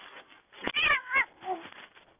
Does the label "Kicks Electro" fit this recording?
No